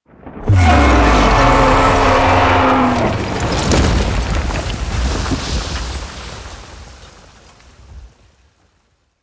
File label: Bridge Intro; Warframe; Cinematics; Duviri Quest; Town Bridge